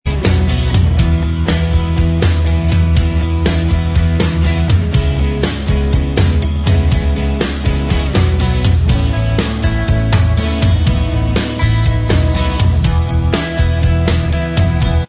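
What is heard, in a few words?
instrumental track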